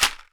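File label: AXATSE, AFRICAN SHAKER; Voice Crystal - Steve Reid Definitive Percussion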